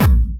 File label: Hard Kick